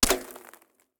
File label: gun miss; Blind Drive